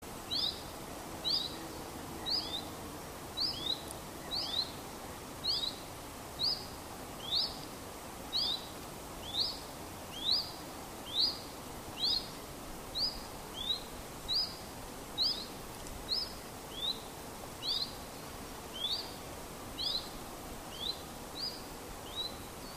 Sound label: mixed huit sweeoo pair